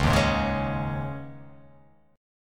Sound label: C sharp Suspended 2nd C sharp, chord; C#sus2; strummed; JGuitar